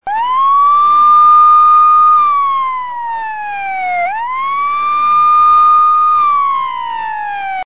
police siren

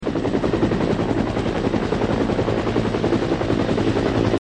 Helicopter fighters

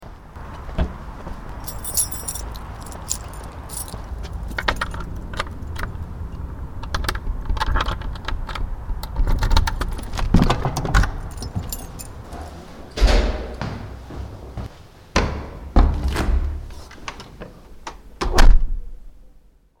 Key And Step Sound

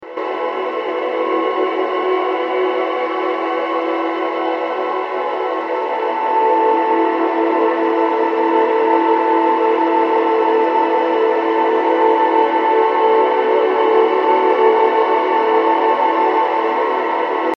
Background Music

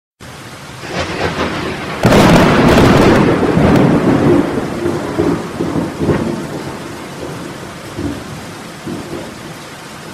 Thunder Rain

